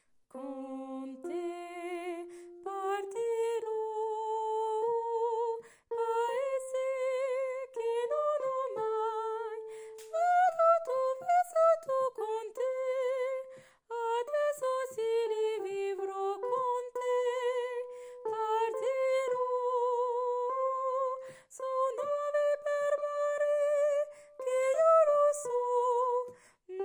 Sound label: refrain 2 et fin tenor; Barcarolle; Mesures 1 à 28